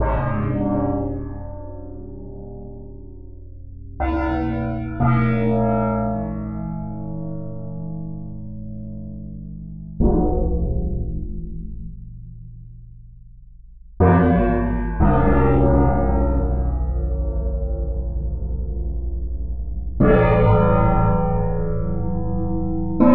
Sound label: gongs